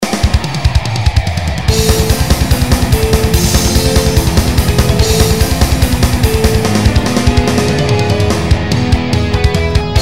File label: Power Up; Barbarian